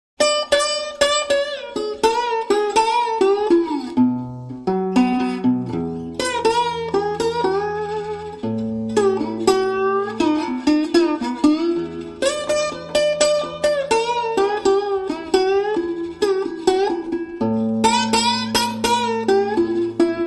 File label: Solo Dobro Blues